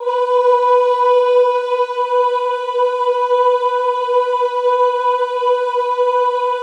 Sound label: OOH B3, Classical Choir; Best Service; Extended Classical Choir; OOH MIX